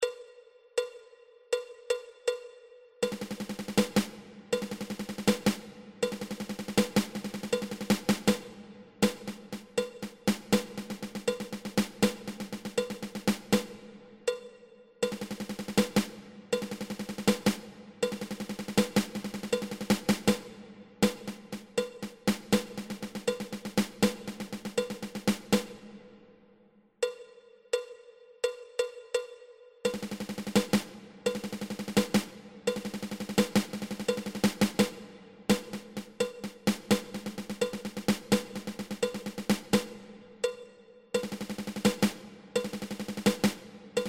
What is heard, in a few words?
Ten Stroke Roll